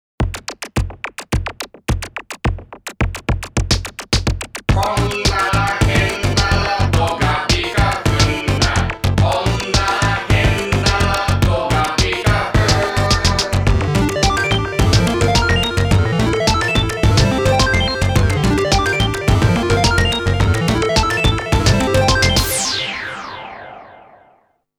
Techno Musical Dance; Hanjuku Hero 4 the 7 heroes sound collection

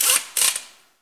weld

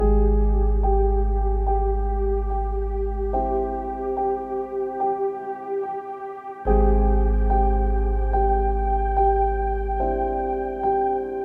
music exploration loop; Necrobarista Final Pour

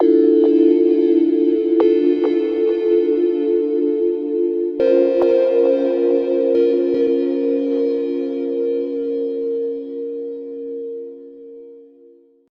sail

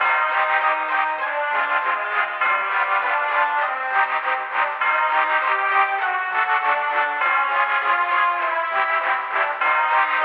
find opponent search music loop

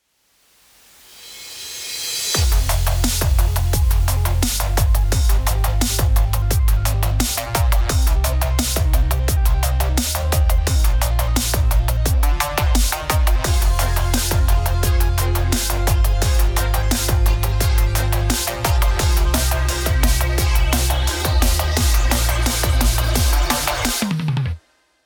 Soft Dnb; Cyber Hook

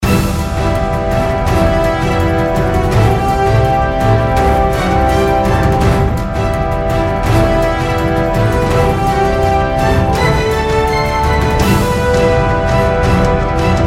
Music Combat Boss Phase

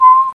hover

